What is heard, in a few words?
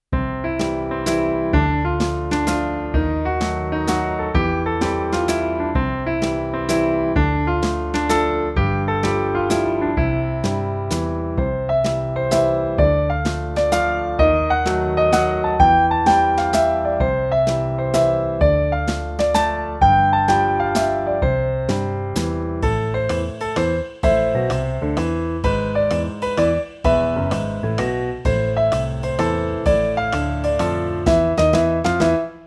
A Jazzy Waltz